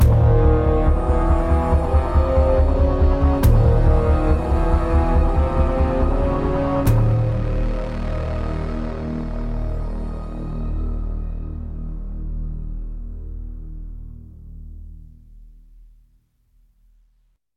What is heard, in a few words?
Monastery Fight Glory Kill; Infinity Blade 3